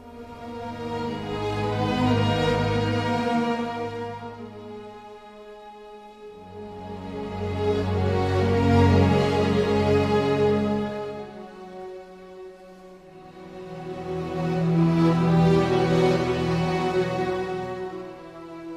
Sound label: strings, sad loop